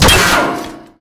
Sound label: railgun; Weapons; EK modern; Barotrauma